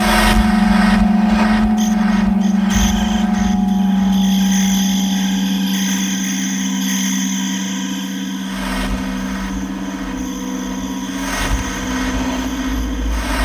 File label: ambience